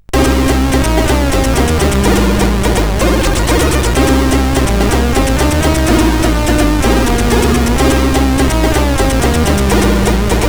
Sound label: CSG8580